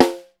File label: SN, Percussion